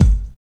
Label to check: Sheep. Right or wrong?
Wrong